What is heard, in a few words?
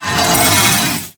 Vault Buttons Up; moul